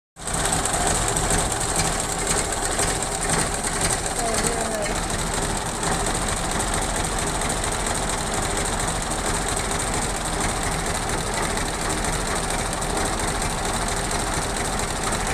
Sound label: 1909 Austin engine; stahls-auto-1909-austin-model-60